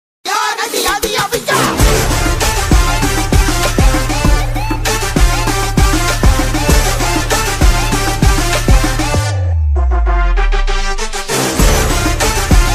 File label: Trailer BGM ringtone BGM ringtone; amaran; sai pallavi